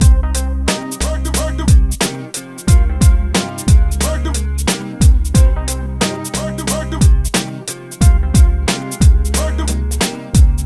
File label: MIX, Hip Hop and R&B; Best Service ProSamples vol.02; AKAI